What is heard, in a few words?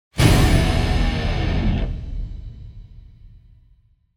Angry Slide; Gothic Storm Music